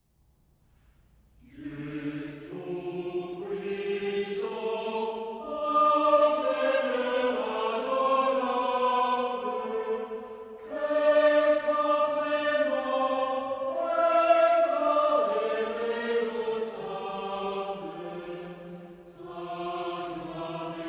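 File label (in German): Psalm 8 in französischer Sprache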